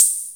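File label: CR78 Hat Open, Roland cr-78 drums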